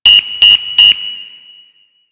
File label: Panel Alarm; Warframe; NineteenNinetyNine; Stage12PartyOfALifetime